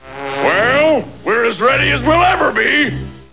bears; LOONEY

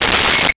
Metal Clang

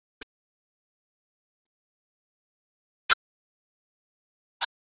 Squirt, Cartoon Water Squirt; HB02 - Hanna Barbera

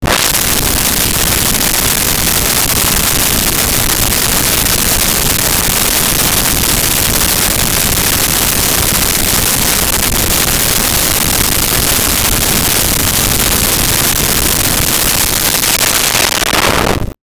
Underwater

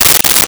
Tape Measure Closed; Tools

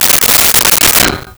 Pots Pull Out

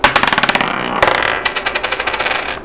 COIN ROLL; Zoemtonen hisses pingen woesjes tikjes

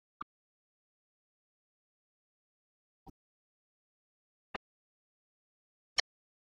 Squeak, Cartoon Little Whistle Up; HB02 - Hanna Barbera

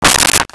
Ping Pong Paddle Placed on Table; Arcade and Games

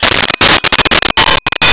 payoff bell

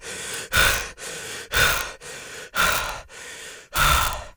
Panting Male; People

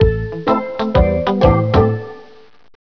calypso; Traditioneel

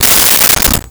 Silverware Pulled from Drawer

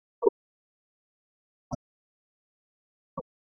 Twang, Cartoon Saw Blade Twang; HB02 - Hanna Barbera

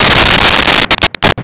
impact meteor fall

